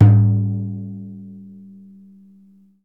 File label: deff open; Deff Bendir &Req